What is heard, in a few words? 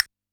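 castanets, PERC; Yamaha DD-65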